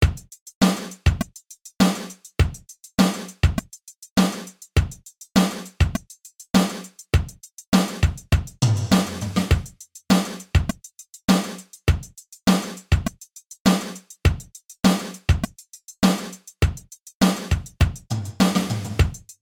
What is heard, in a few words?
Drum Rev Gate